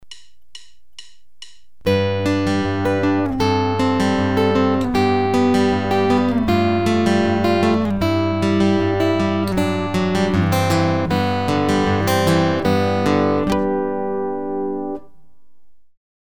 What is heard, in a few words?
Travis style with open-G tuning, guitar